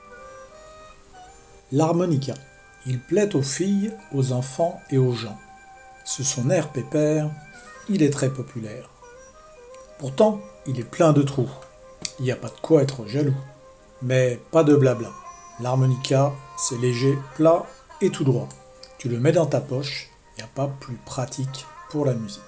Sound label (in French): Récitatif harmonica